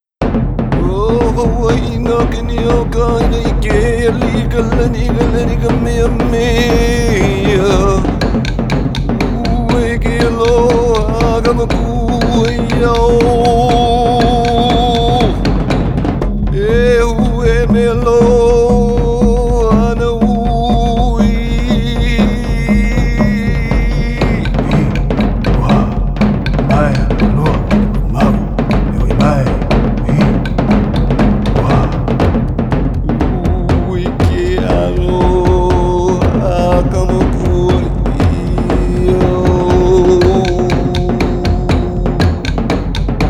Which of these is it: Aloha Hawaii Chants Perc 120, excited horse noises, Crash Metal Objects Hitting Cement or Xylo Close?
Aloha Hawaii Chants Perc 120